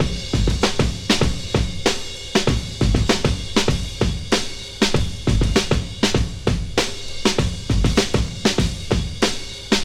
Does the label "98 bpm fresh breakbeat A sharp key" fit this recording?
Yes